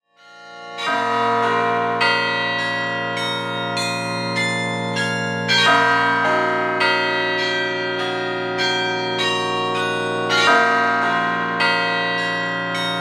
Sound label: Benedictus, carillon; Range: 4 Octaves; Publisher: SMP